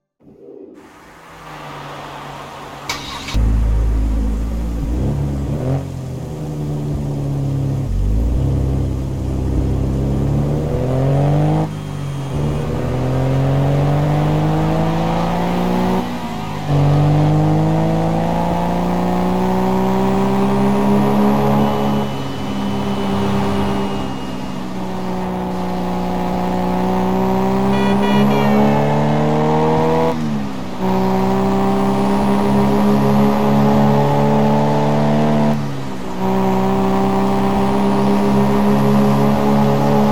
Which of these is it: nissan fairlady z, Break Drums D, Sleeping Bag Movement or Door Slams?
nissan fairlady z